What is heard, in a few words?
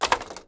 blaster drop creak; objects; Star Wars Obi-Wan; XBOX